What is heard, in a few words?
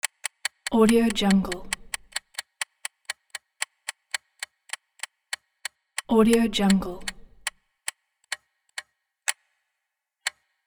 clock slowdown; enderguneymusic